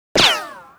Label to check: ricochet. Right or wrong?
Right